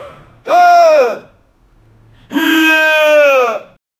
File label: man shouting because of